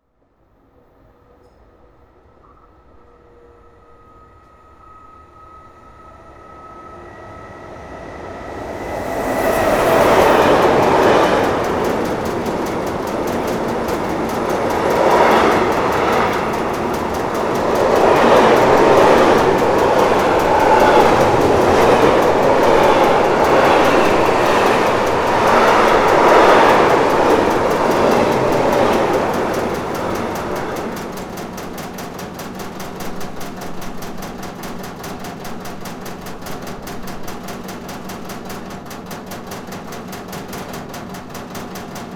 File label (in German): d-Zug durchfahrt; Peter Strickmann; Audiodoku